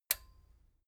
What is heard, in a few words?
metal button stereo release enable